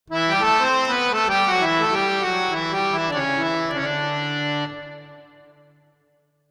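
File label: Verb, Violin, 4-4, D minor, 100 bpm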